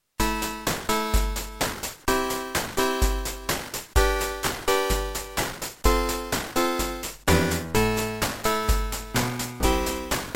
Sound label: Beepblaster 2.0 BEEPMODE; raptor call of the shadows